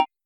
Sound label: UI Cancel; SoupTonic UI1 SFX Pack 1